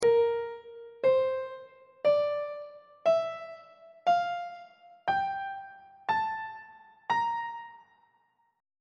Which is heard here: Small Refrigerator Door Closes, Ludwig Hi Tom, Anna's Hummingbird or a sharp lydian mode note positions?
a sharp lydian mode note positions